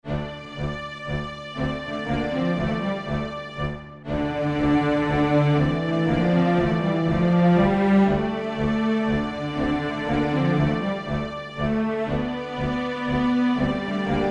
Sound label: Outro Music